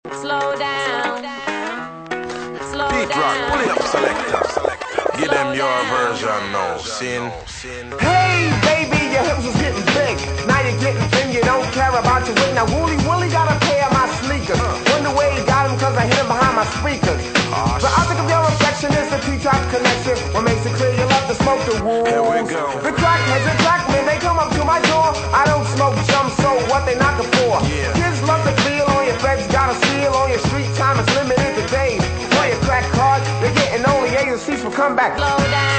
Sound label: intro rap refrain